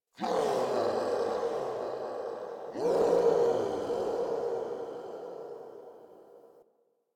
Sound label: Chatter Distant Growl Moan, Ambience; Warframe; Infested Eris; Outdoor